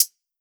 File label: Closed Hat Gentle, 909; Slate; Vintage Drum Machines Volume 3 909 Edition; 909 Closed Hats